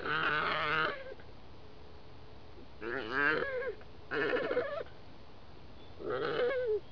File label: whicker